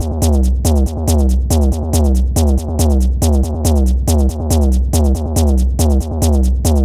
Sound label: Perc 140-BPM, Percussion Loops; Zero-G; Club Techno; Drum Loops